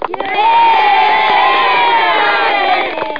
kids

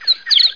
tweet

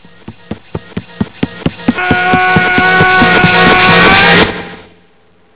fast; Reflux

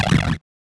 small explosion; Far Gate

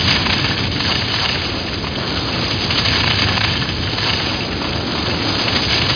SLIDES; FPS SkiRacing; Skiing Demo

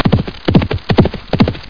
gallop

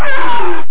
monster

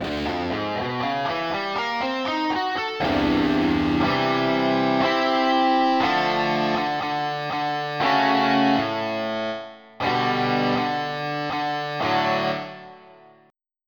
Charang; EverQuest

